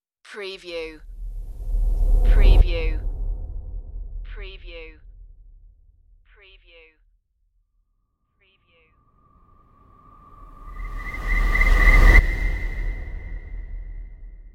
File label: scary whooshes